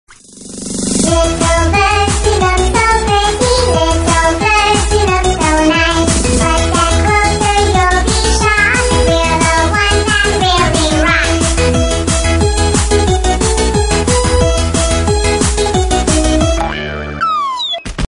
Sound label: Little Birds Rock; Ringtones